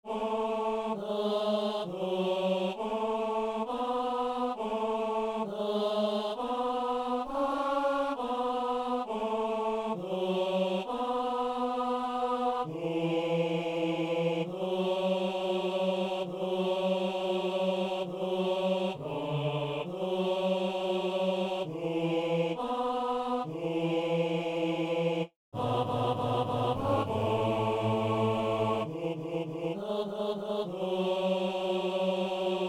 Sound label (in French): hommes